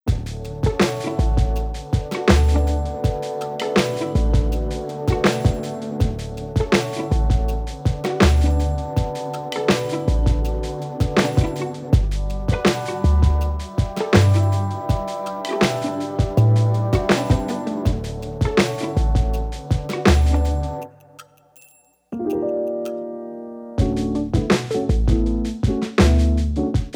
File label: Indie Pop; IKEA Smart Home Planner